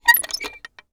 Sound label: Industry Metal Latch Squeaks